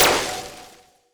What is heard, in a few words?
Simple Gravity Switch FX Em9; Video Game; Cymatics; Video Game Sample Pack Vol 1